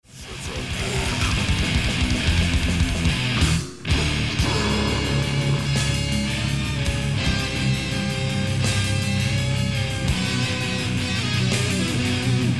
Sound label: harmonies